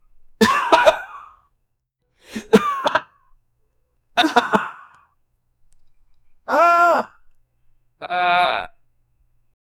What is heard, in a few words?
villagers gasp one collec